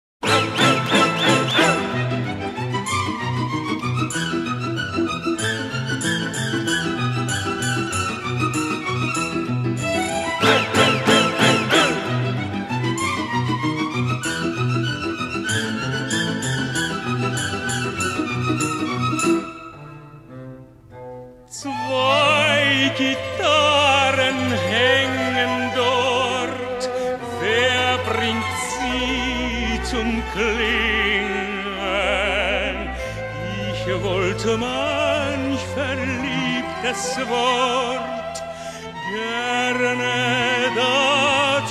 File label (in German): Zwei Gitarren